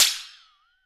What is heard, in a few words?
ALUM THING, Living Drums; Sampleheads; Peter Erskine